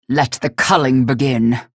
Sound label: match start; galena; unholy paladin; frag